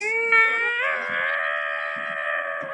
intense screaming

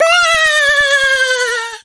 jumpscare

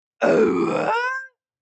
aouugggghhaa

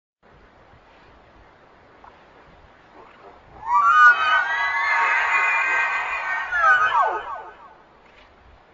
wendigo roar

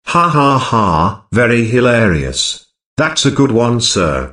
haha

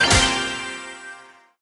play button sound; brawl stars

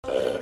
burrrrp

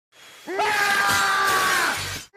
screaming; mr noisy

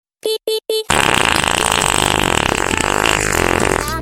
fart

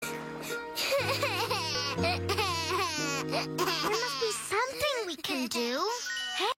raccoon crying

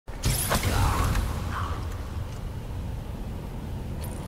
crossbow bolt; dishonored

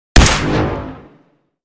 laser rifle shot; fallout 4

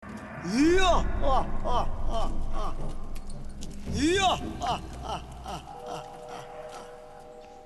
laughter; cidade de deus; shamans

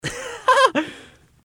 laugh

